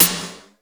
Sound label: BG SNR, METALIC DRM; Crypton - DrumBase5000; METALIC DRM2